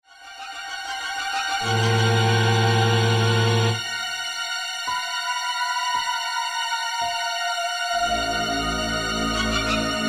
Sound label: Tense and Scary